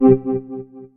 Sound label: Menu Interface Cancel